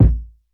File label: subtle reverb kick drum sound a key; kick drum samples